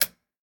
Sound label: Button; miside demo windows gamerip 2023